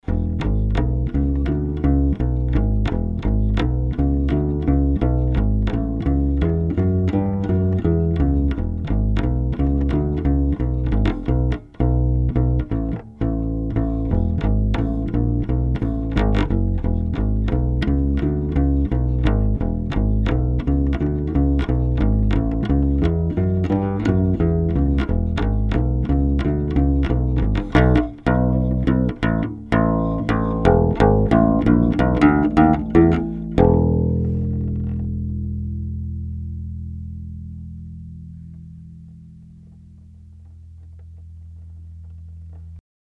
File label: bass